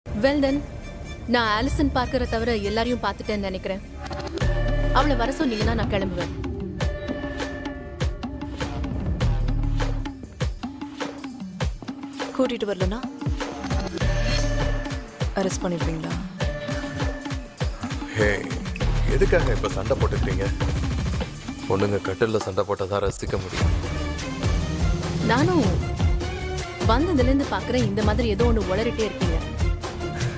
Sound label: naane varuven bgm; berlin attitude; tokyo attitude; money heist; professor; tamil